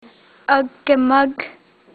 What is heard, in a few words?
snowshoe